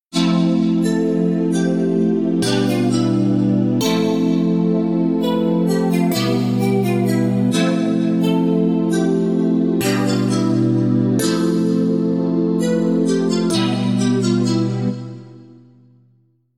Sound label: KEYS